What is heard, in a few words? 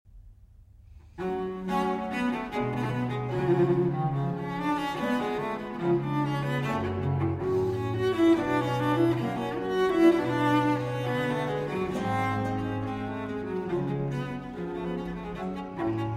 Cello Sonata in G Minor, Andante; Vivaldi